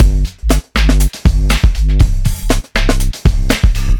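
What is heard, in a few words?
120 breakbeat sub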